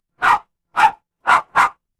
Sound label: animal dog bark